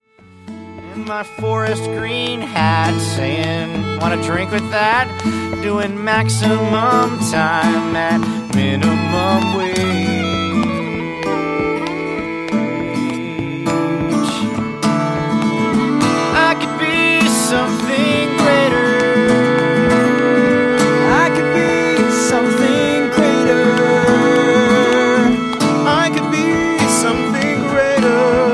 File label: subway song